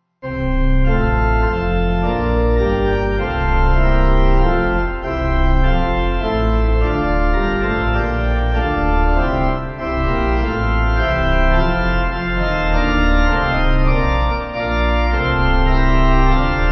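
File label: Pipe Organ; Cromer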